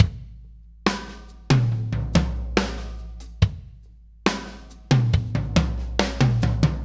Loop, Drum Tools, Laid Back; Sonic Foundry (Sony Creative Software); Producer Dan

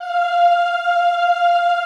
OHH F4, Classical Choir; Best Service; Extended Classical Choir

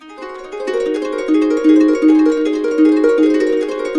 BISBI, Complete Harps; USB Soundscan vol.62; AKAI

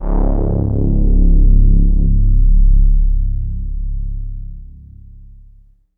diggin, excessive stereo; E-MU Producer Series Vol. 6 – Analogia Project; Strictly Synthe

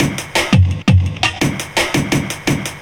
Break, Jungle; Haze; Breaks

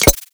bang snare

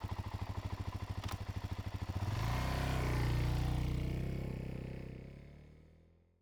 Suzuki DRZ400 EXT SLOW Various ORTF; Pole Position Production; MKH8040

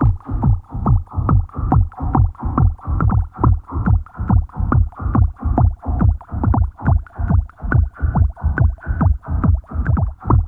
UWATER, WET LOOPS; Zero G; Malice In Wonderland

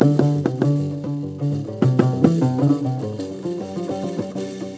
DOO DOO DRUM, SINTIR; Spectrasonics; Heart of Africa 2

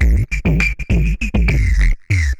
drloop, drumloops, Vinyl Attack; Masterbits

